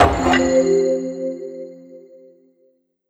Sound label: ui item get